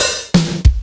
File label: DigiTech RP2000, Samples Sliced